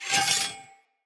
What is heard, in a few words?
Sphere Sword Whoosh Accent; Weapons; Swords; Sphere Sword And Board